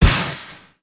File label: Full Steam Ahead Pulse